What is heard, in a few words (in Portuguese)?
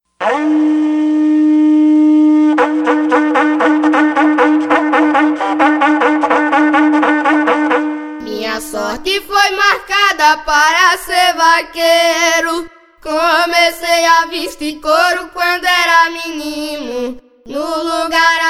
toada